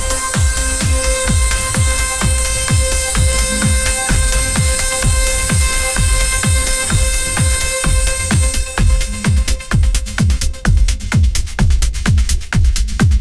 electronic dance music, ringtones

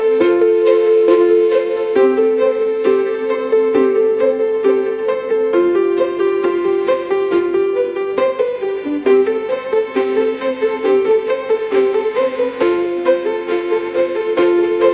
orchestral strings; ai

